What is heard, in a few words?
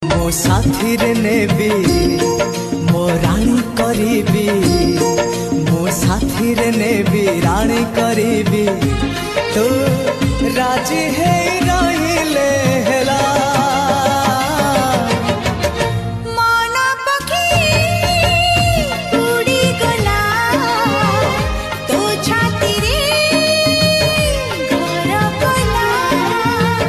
Odia Ringtone